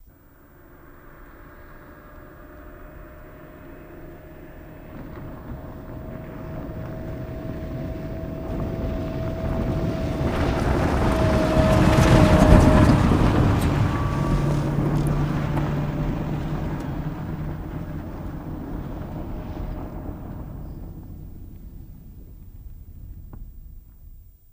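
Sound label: Electric Car Pass By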